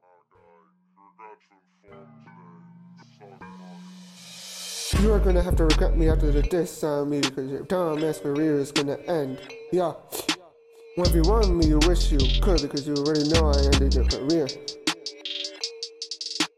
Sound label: type beat